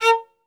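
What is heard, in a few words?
solo violin, strings; ILIO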